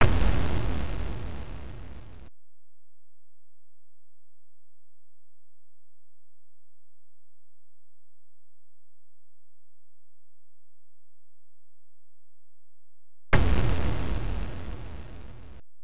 Opening 1 (sound effects); Xak The Art of Visual Stage; MSX2; PSG

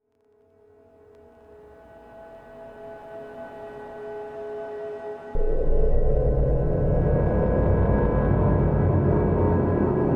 Ambience; Triton

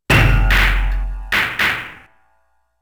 Performance End (Game Over); Samurai Shodown II

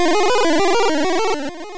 Spite

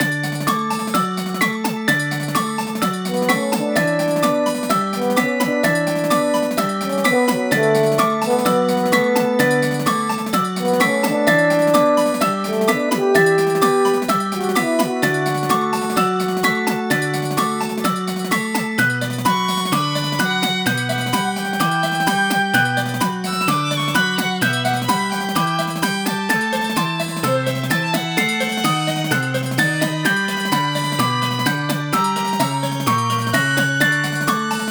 Ballad (Ensemble); Legend of Zelda The Link's Awakening 2019 Remake

